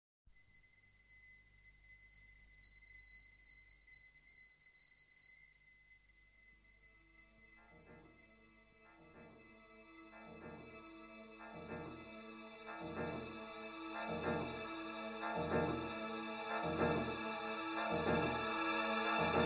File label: electro acoustic fanfare